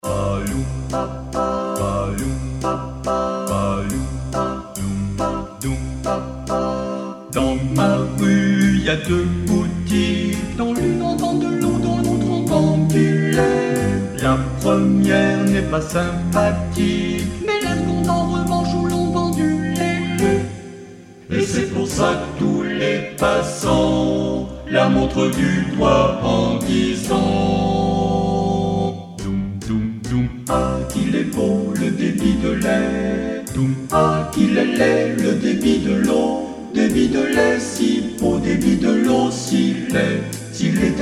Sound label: Jazz